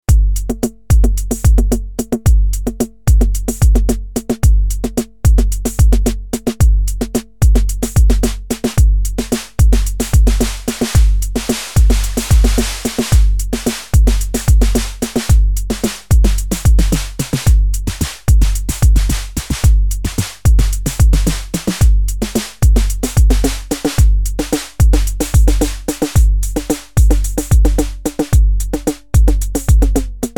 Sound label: 503 snaredrum